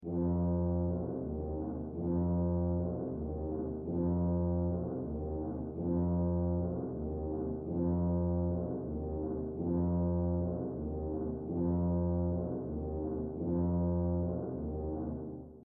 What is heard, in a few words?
Tuba lick